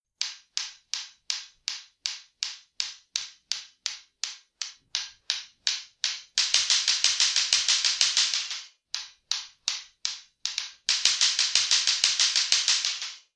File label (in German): Klick Klack